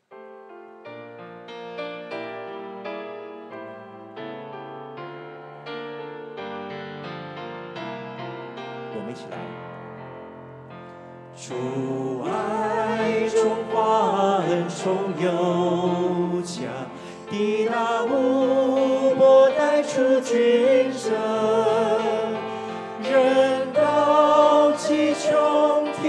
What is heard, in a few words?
Anthem; CCCOWE